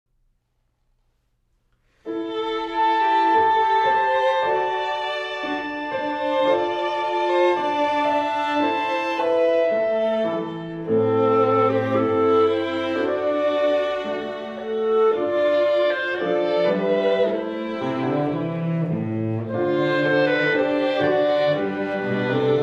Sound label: Piano Violin Clarinet Cello